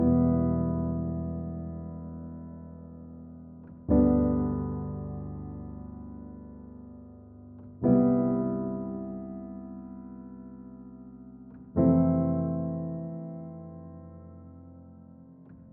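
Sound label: 122 BPM Piano Chord Progressions Cinematic Drama C major A minor Tonic E; mmb studio melodic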